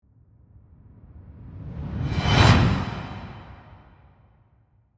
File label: Whoosh Metallic, Slice; Whooshes; BlastWave